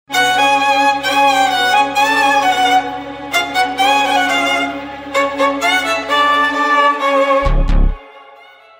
violin

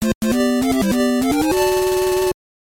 Tetris beat level

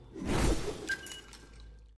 Tripmine plant

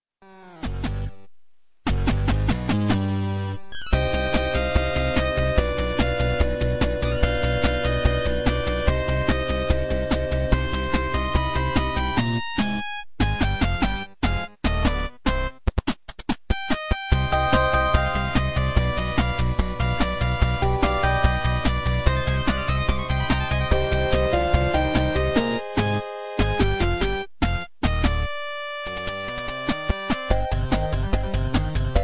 theme song 8 bit version, ringtones

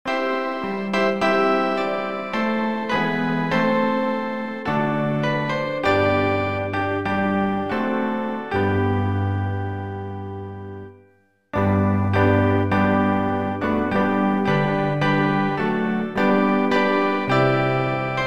hymn to christmas

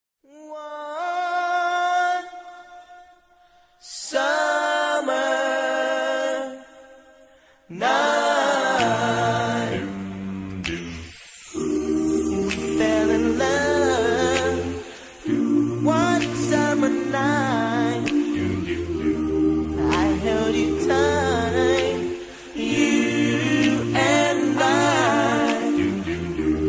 acappella

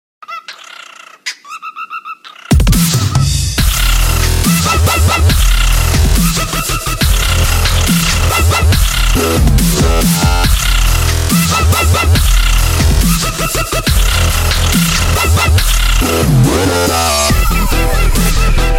Dubstep

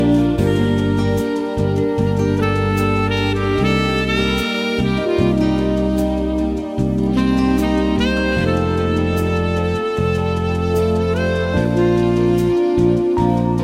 sweet sax; classical

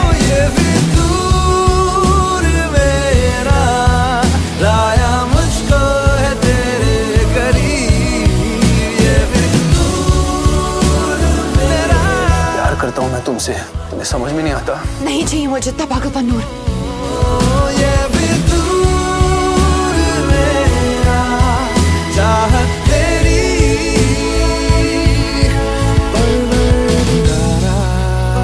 Movie Rington

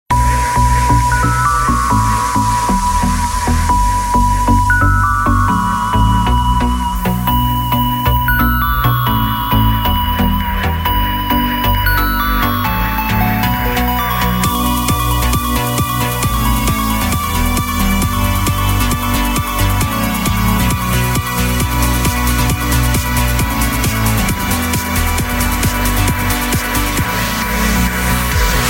soft trance

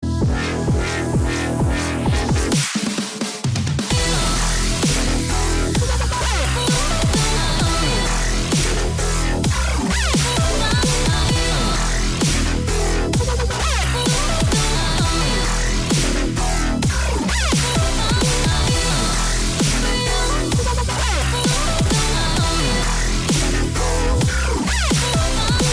dubstep

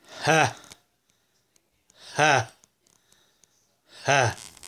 Sloth Laugh; Zootopia

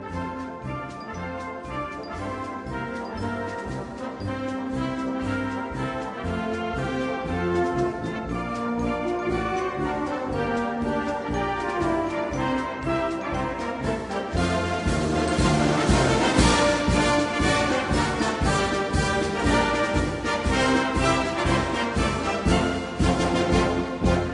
Soviet March

